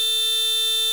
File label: HORN YBR, YBR125